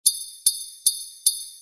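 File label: percussion sleigh bells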